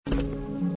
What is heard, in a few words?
Spin Stop Win; Aztec Fate